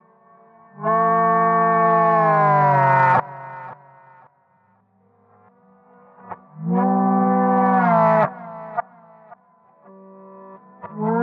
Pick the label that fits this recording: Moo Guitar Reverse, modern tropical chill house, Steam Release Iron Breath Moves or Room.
Moo Guitar Reverse